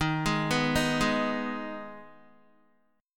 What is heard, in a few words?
D sharp Suspended 4th Sharp 5th, Guitar; arpeggiated; chordshape